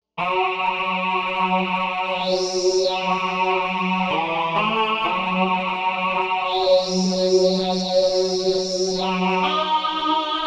Novation UltraNova; Factory Patches

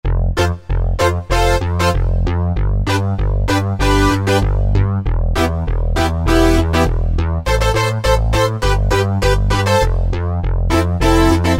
Synth Bass Split, Korg Wavestation A-D

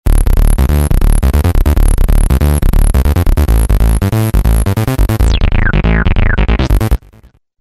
TB Saw Reso Bs, Roland V-Synth; Factory Patches

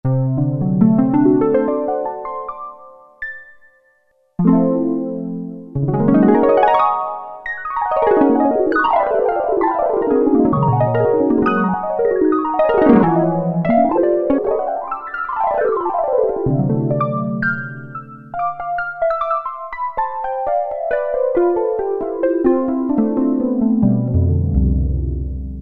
Harp, Roland MKS-50; Factory examples

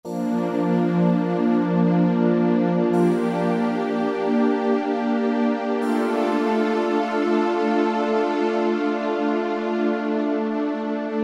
Phat Bass & PAD, Korg TR-Rack

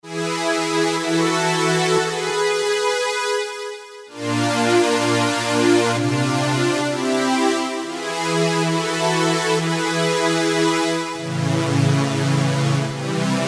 PWM Strings, Korg MS2000; Factory Patches

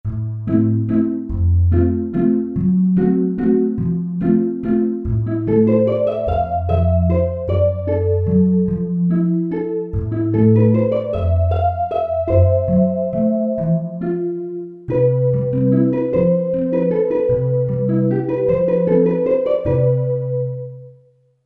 Pluck Waltz, Korg MSC-11S Brass

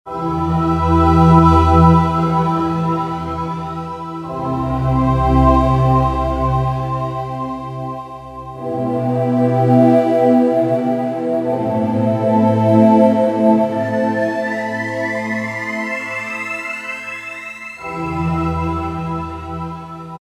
Casio CTK-601, Tones, Syn Pad

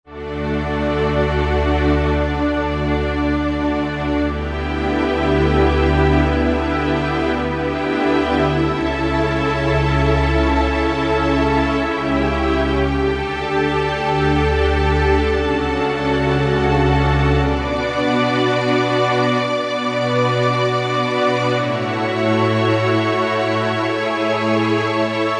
Oct Strings; Roland SR-JV80-09 Session

